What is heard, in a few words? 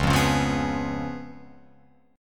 C sharp 7th C sharp, Guitar Open F; C#7; strummed; JGuitar